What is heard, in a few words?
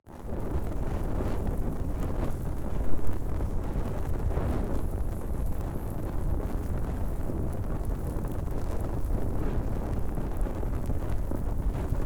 BURNING DEBRIS, Fire sound effects